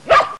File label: bark, dog; Fate The Cursed King; MONSTERS